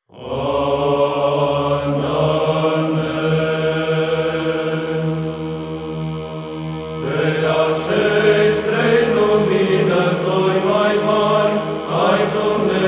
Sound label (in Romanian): Troparul Sf. Trei Ierarhi; duminica a XXVII a dupa Rusalii; tamaduirea femeii garbove